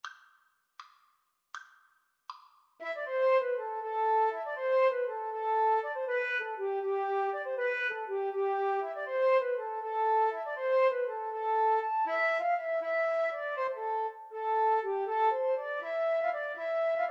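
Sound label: 2 flute karaoke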